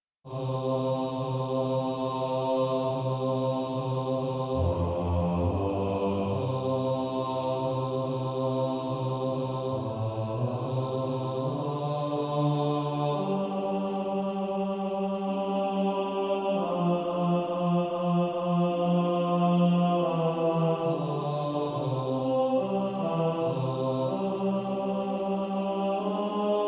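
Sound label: bass, practice music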